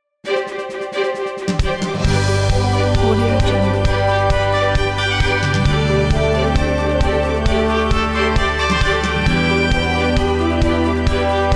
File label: news report ident